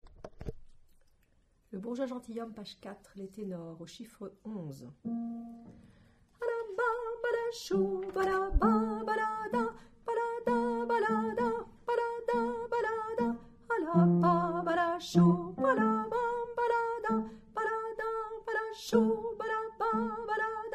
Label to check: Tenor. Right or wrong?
Right